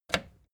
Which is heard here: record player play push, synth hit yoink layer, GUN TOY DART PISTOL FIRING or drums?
record player play push